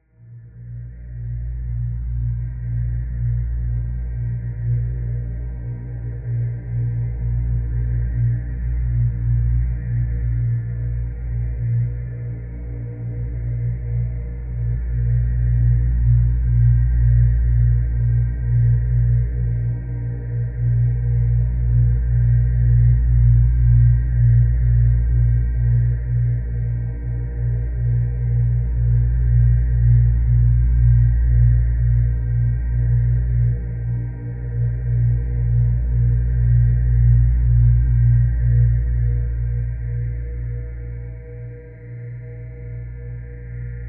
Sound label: low drone; 3maze; Cinematic Drones